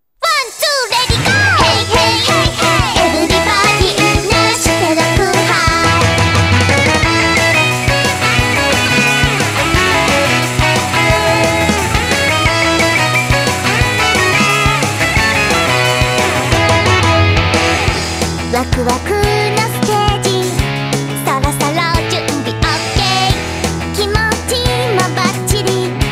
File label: BGM rock; tamagotchi seishun no dream school 3ds